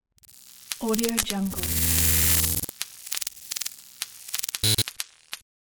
electrical transition with a bang

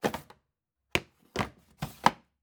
drawer washing machine

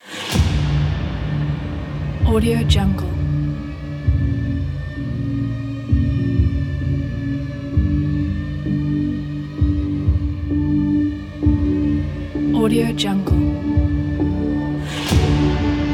dark cinematic

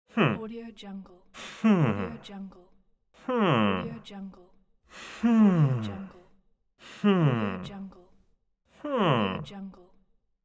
male voice hmm

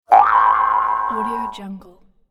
boing; volkovsound

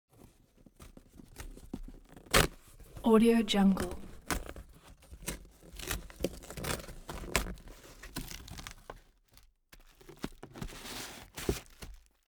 object box cardboard big open handle; smartsoundfx

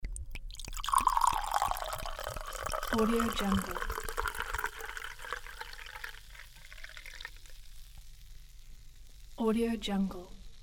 serving hard cider

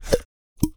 suction pop

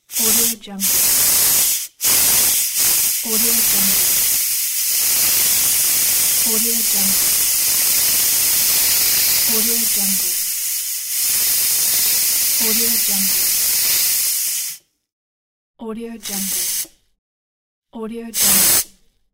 steam release in pressure cooker

